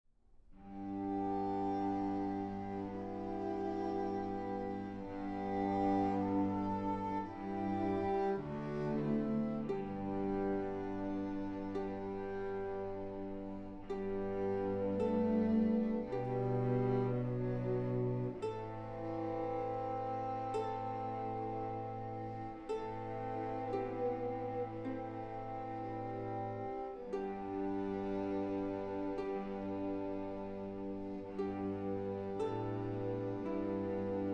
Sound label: with ukulele